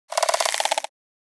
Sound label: Insectoid creature alien hurt